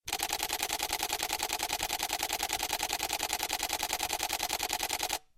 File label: canon eos 90d burst